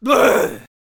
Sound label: Attack Vocals